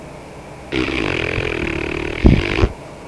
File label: tongue; People; Sound Library 2000; Wayzata Technology